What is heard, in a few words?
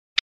Hawk Call; Birds And Animals